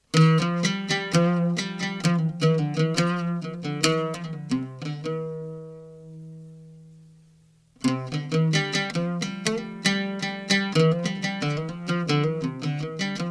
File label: OUD TAKSIM